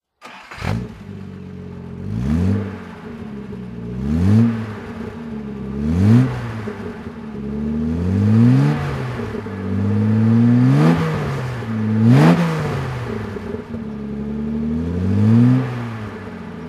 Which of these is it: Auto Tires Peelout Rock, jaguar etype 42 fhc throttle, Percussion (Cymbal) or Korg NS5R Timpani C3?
jaguar etype 42 fhc throttle